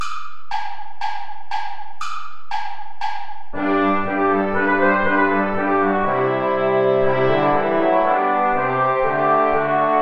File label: mit lieb bin ich umfangen play along without trumpet; steuerlein johann